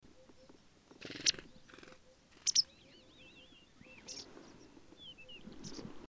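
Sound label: white wagtail baicalensis, telmen nuur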